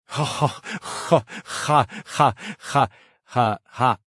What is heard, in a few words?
ahahahahahahah